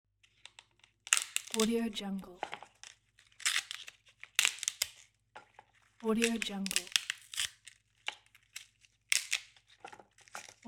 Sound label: breaking celery sticks; applehillstudios